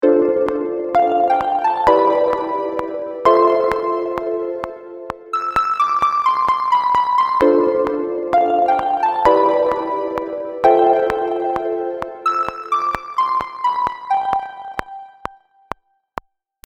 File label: Pluck; Luminis